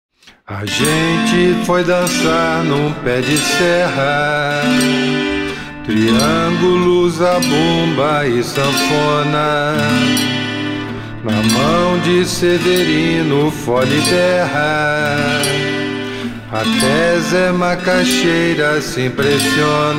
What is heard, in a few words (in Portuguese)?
forro pe de serra